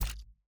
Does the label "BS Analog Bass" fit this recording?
No